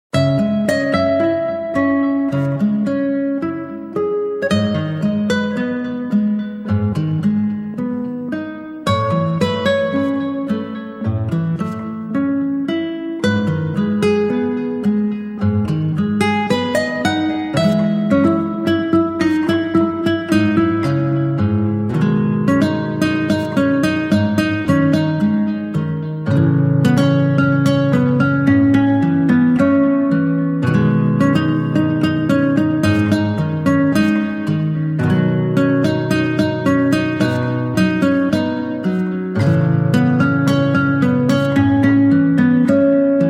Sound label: Guitar